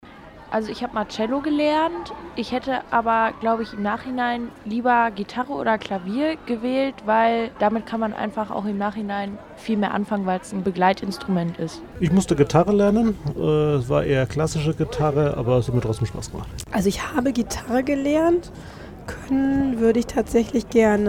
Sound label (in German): umfrage blockflöte